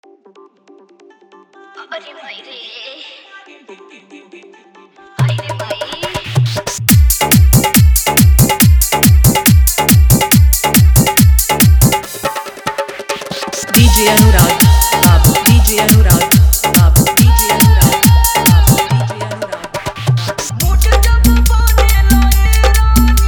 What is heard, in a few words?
Hard Dhollki Bass Mix